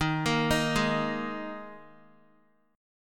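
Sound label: E flat Suspended 4th Guitar, chord; Ebsus4 arpeggiated; x,6,8,8,8,x; JGuitar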